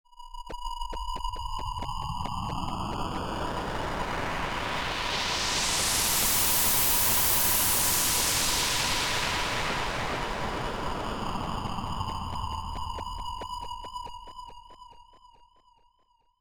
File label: Pink Noise Effix Delayed; Techno